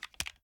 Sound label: reload fast end, reloads; gmod